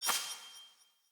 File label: Player Short Sword Perfect Wind Up; Weapons; Swords